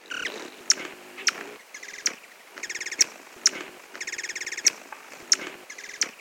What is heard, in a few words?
mag hummingbird